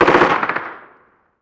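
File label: gun mp5 distant; Call of Duty Modern Warfare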